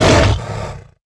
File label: bite; weapons